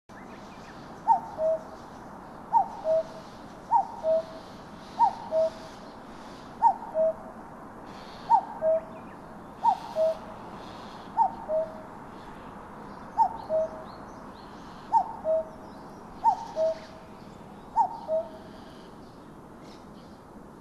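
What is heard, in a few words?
kakko, Common Cuckoo; kokubunji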